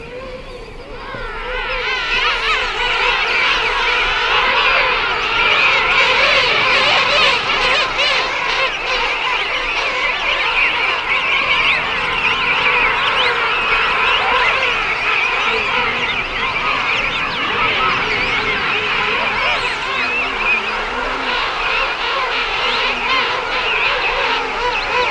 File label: King Penguins